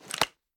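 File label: pistol reload empty fast end; gmod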